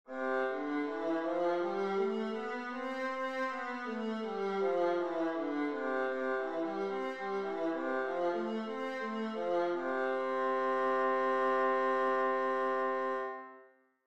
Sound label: viola c major, scales